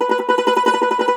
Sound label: Cumbus Saz; E-MU Producer Series Vol. 7 – Old World Instruments